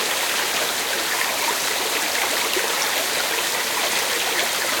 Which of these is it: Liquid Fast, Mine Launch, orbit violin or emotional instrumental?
Liquid Fast